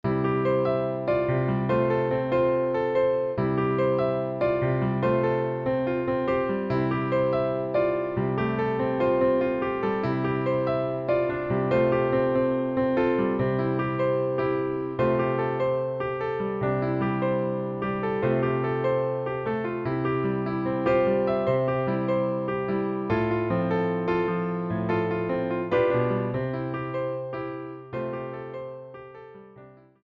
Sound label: Karaoke Version